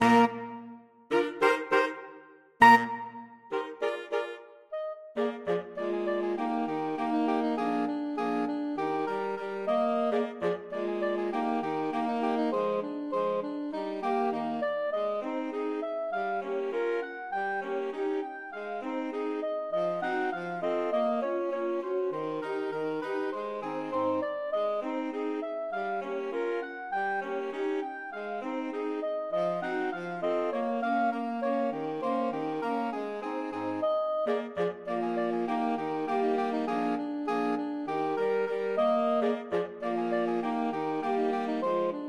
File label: pizzicato polka, saxophone quartet